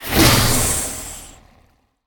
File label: intro roar; smite; scylla